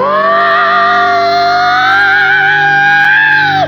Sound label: oooooohhhh baby SUNG, Voice; ooh ahh